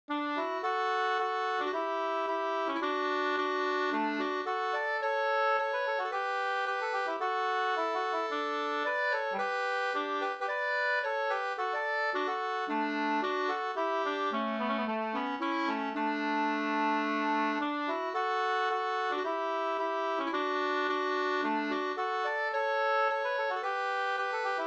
brudmarsch; Storbergsmarschen fr Dorotea

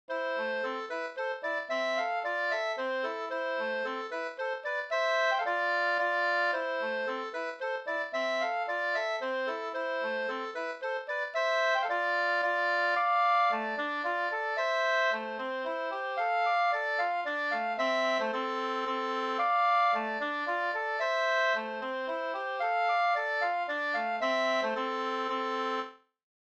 slängpolska

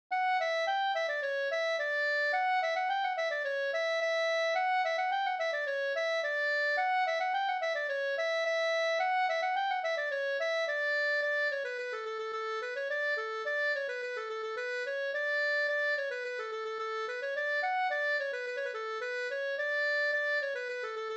engelska

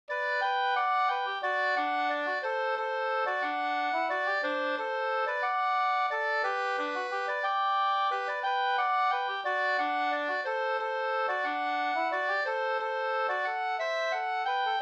folkdans; Hammerschmiedgesellen 2st

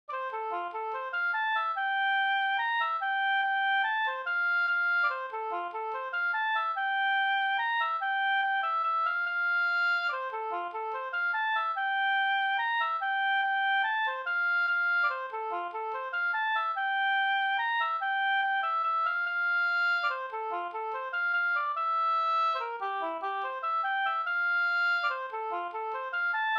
mazurka

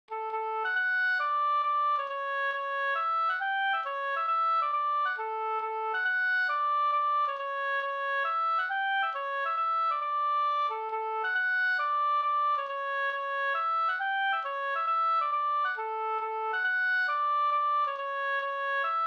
hambopolska

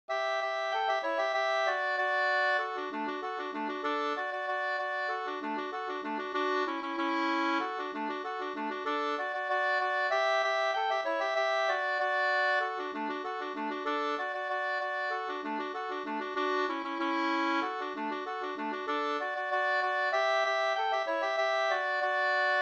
folkdans, Sexmanengelska fi; fr Finstrom Svartsmara 2st

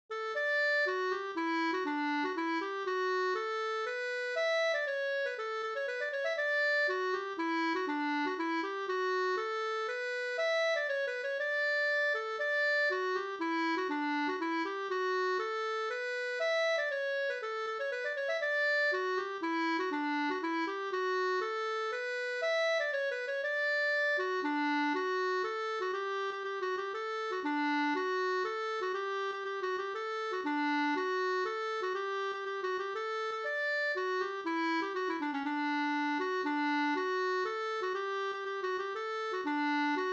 hamburska; Ringkarleby Nk

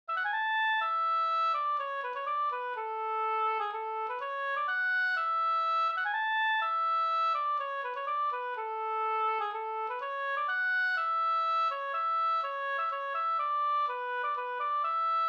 polska Brudpolska

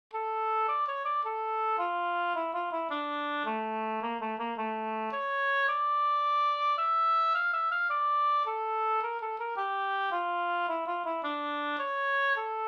polska

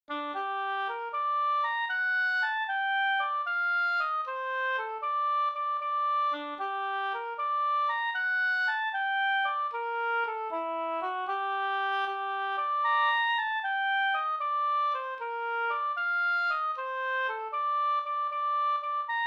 vismelodi

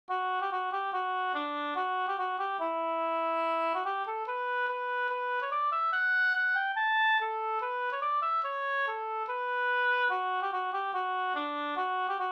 schottis; Luffarschottis av Karin Hjelm

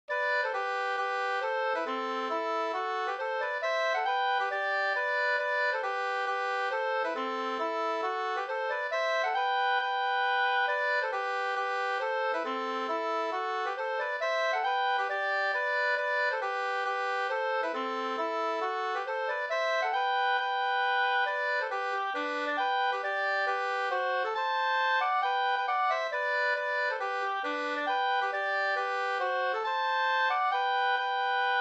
mazurka, 2st

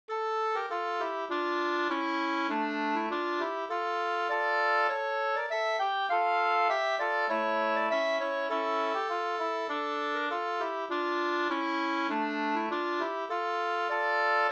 ganglat, Avskedslat; Erik Ronnlund

